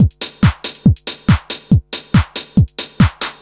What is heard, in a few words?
Dance Drumloop